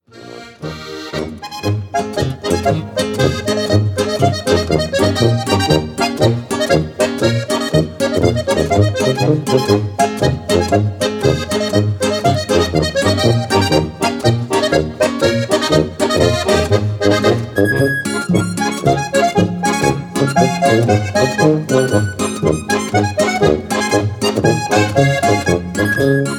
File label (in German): polka, tanzlmusi